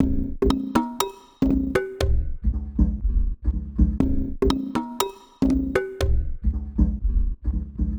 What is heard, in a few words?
Perc Loop 120; Equipped Music; Loungin House; Perc Loops 120